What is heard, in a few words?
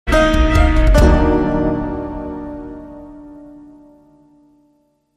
Suspense